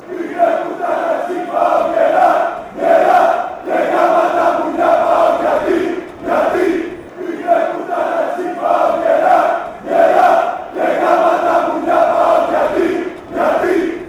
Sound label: chant, fanchants; paok fc